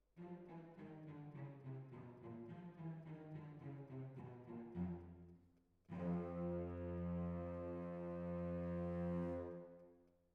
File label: Violin Solo